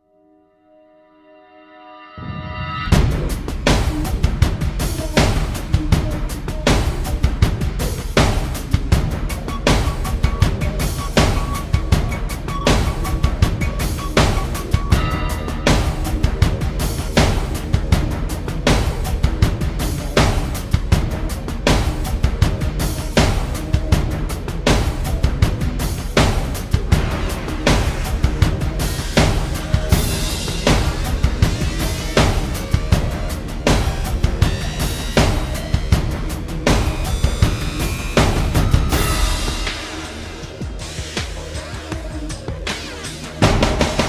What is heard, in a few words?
Thunder God (Drums); 2017